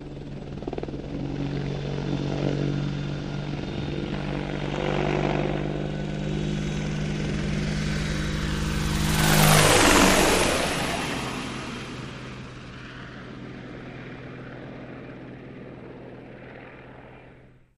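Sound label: Apache Fast By Right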